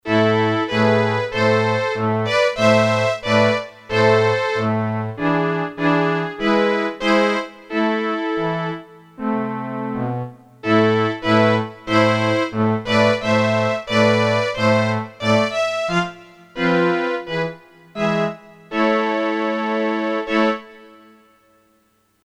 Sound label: Violins, Korg DSS-1 Strings